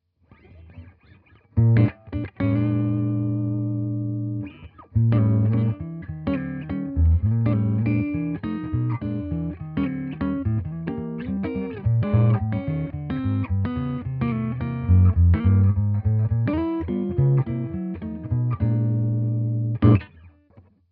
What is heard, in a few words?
Clean Les Paul Class AB Jazzy